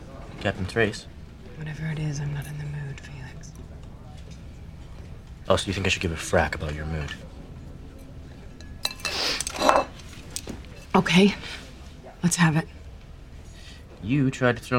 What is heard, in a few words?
applause applause applause; BSG; A Disquiet Follows My Soul